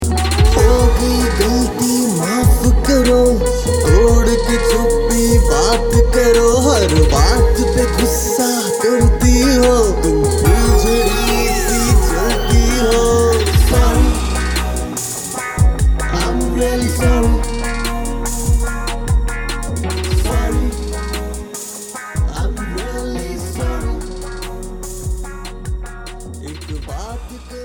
I AM SORRY HINDI MALE

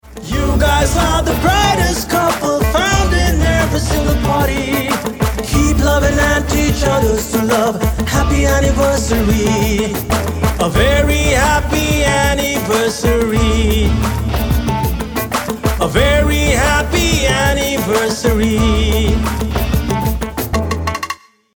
ANNIVERSARY TUNE RAP GENERAL ENGLISH MALE

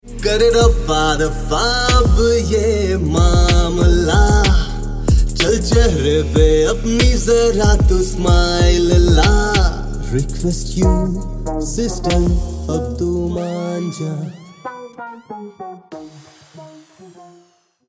I AM SORRY HINDI BROTHER TO SISTER MALE

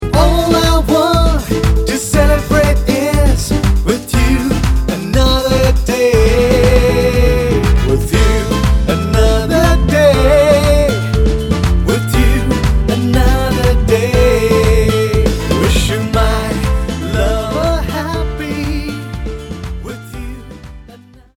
HAPPY LOVE DAY MALE ENGLISH

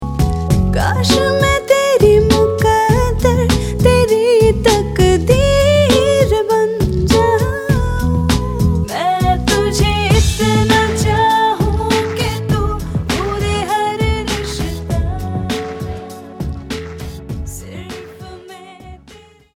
love day song Hindi female

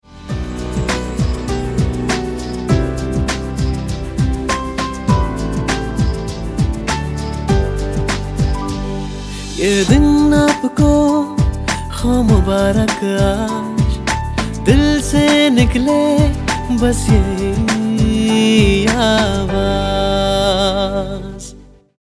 HAPPY BIRTHDAY TUNE SON TO MOM HINDI MALE

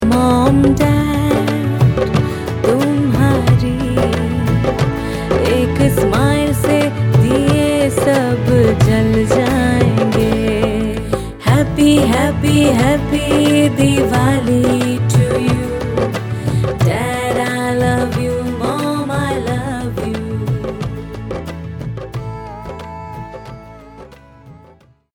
DIWALI FEMALE HINDI

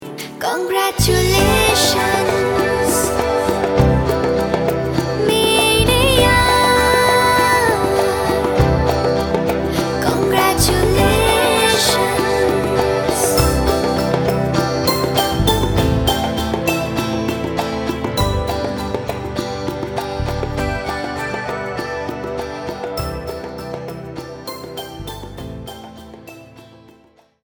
CONGRATS FEMALE HINDI

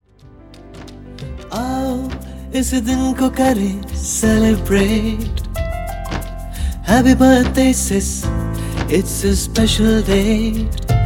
HAPPY BIRTHDAY TUNE BROTHER TO SISTER HINDI MALE

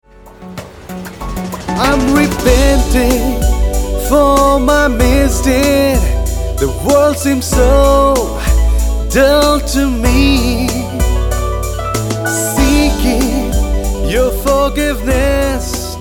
I AM SORRY ENGLISH MALE

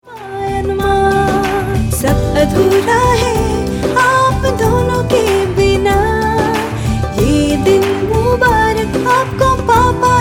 ANNIVERSARY TUNE CHILDREN TO PARENTS HINDI FEMALE

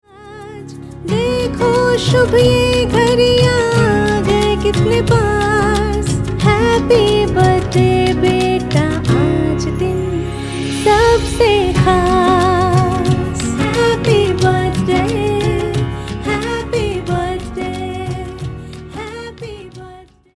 HAPPY BIRTHDAY TUNE MOM TO CHILDREN HINDI FEMALE

